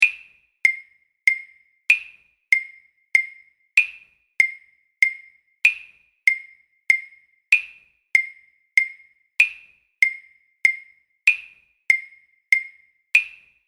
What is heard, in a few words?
Triangles, drum kit; Step 2 List B